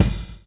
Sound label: GRV, Groove; AMG Gota Yashiki Groove Activator